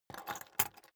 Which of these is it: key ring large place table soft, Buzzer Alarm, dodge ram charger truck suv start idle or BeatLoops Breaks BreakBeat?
key ring large place table soft